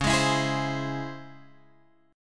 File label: C sharp Minor Major 7th C sharp Guitar Drop D